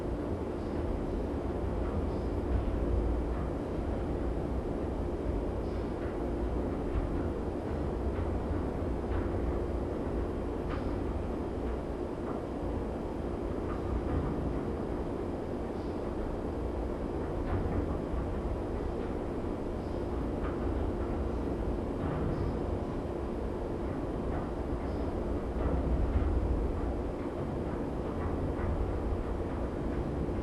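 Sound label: Ambience Interior Hallway; Boomtown; Titanfall 2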